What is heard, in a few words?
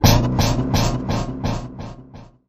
Harsh Tone Bouncer